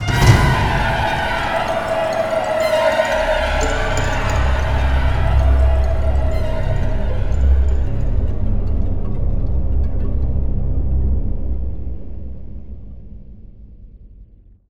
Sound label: Music Stingers stinger Skeleton Reveal; Hunted: The Demon's Forge; x360